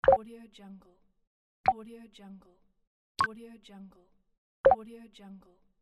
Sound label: button clicks; danielmix